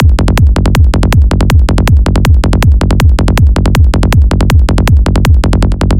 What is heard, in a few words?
160 full drop loop psy G, Neo Rave; Neo Rave Essentials Vol 1; flawxmusic; sample pack